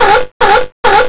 sea lion

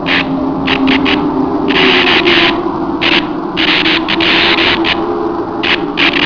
vortex

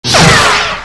lightsaber hit

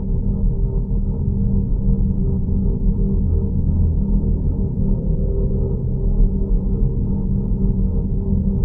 humming

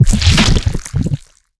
alien chamber die

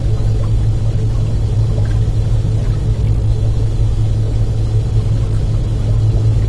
amb rumble machinery; dm subchamber

